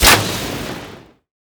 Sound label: Archer Attack; Ferea